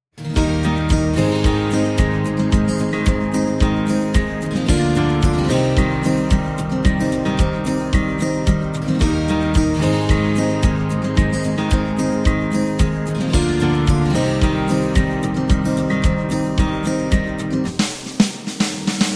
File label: Guitar and Strings; happy music vol 9